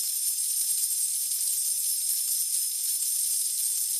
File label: super mega win coins